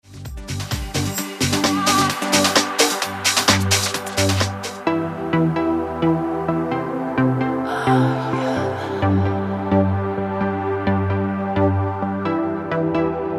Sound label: dance music